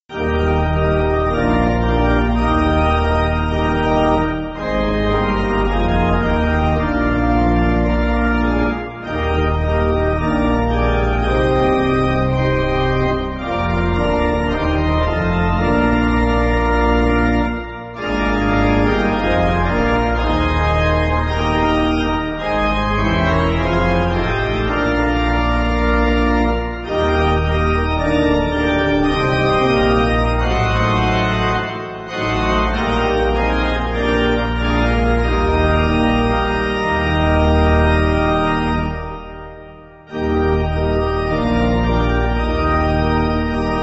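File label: Pipe Organ, Music Backing; Go Ye Forth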